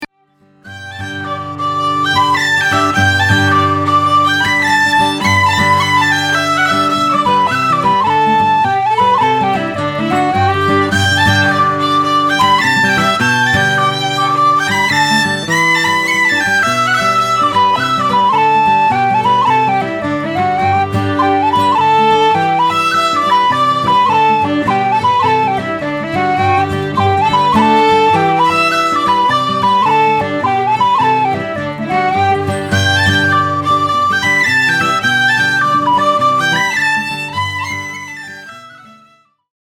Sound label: whistle